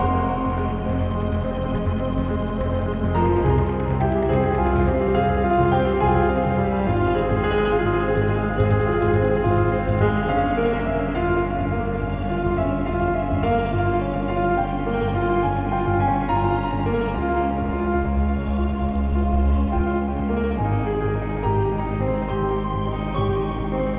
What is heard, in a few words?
Glitch sonata Slow; rive wreck hack die retry; ps4